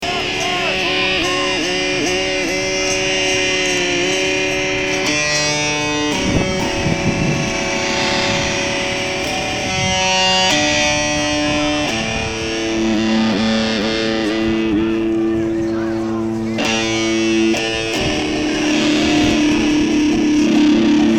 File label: star spangled guitar